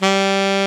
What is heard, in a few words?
SAX A. MF G, Alto Short; Roland LCDP07 Super Sax